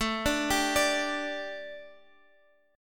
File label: D Suspended 4th A